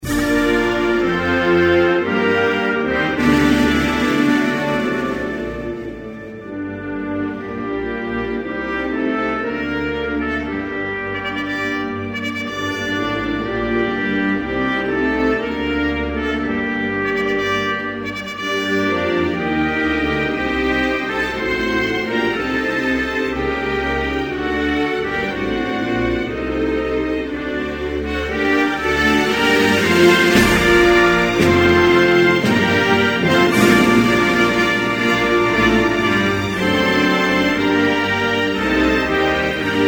Bulgarie, Hymnes nationaux

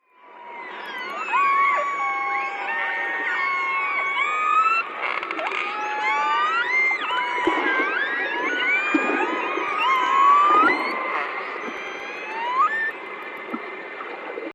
orques

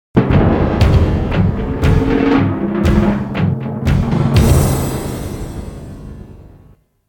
Etrange link, jingle; Jingles medley

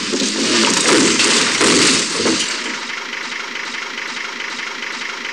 Camion devalant escalier; Accidents voitrues

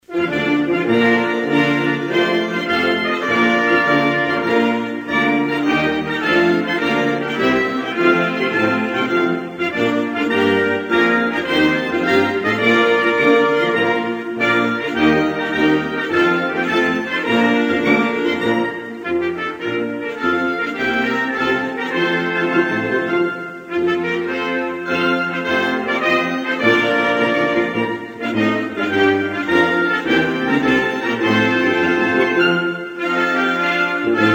Cameroun, Hymnes nationaux

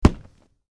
Coup de poing; Coups de poing

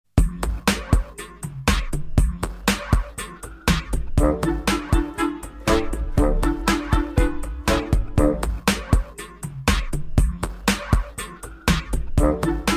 Enfant histoire Minimalist; Jingles medley

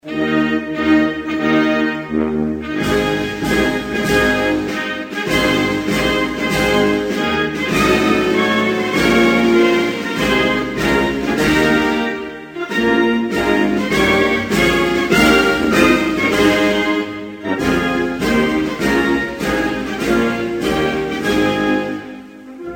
Syrie, Hymnes nationaux

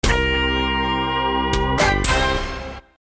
Emission link, jingle

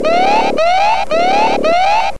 Alarme

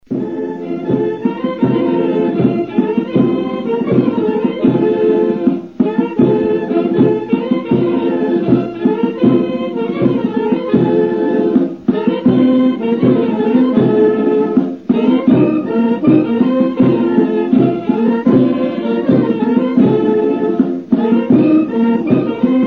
Bhoutan, Hymnes nationaux

